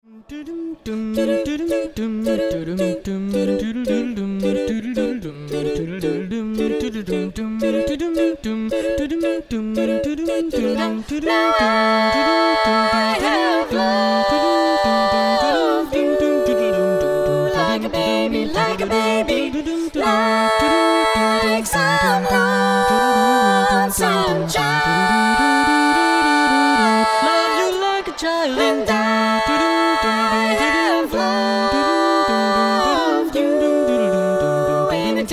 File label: female